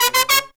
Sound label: Pop & Funk Brass, 096